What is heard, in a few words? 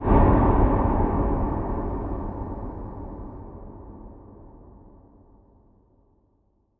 supernatural wind gust